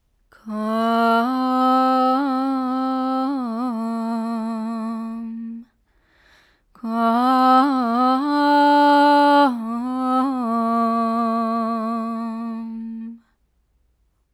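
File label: female vocal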